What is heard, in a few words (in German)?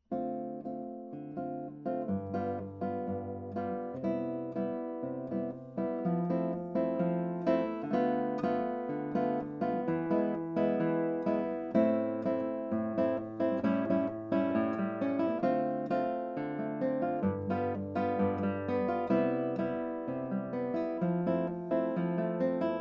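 Bossa Style, Gitarre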